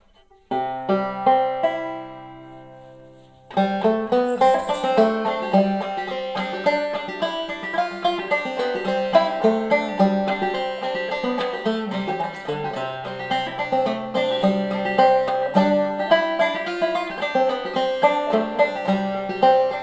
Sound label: banjo; Arkansas Traveler; 11" outer diameter; 2.7" depth; 0.75" wall thickness; Cooperman three-ply rim